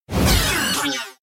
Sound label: battle defender attack critical; hideout